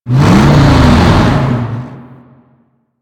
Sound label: APC Move Start; Enemies; Lasrian; Warframe